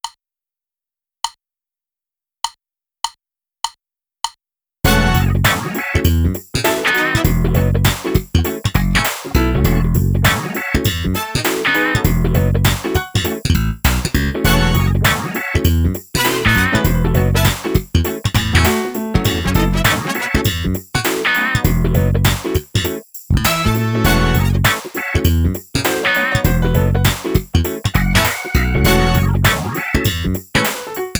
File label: Funky but Smooth drumless